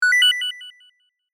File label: classic game action positive